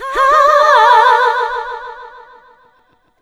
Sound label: AHAAA VOX, ADLIB WOM, Funky Vocals; USB Soundscan vol.18